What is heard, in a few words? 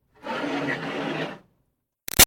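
Water Spray Interior Car By Hood Med; Car Wash; BigRoomSound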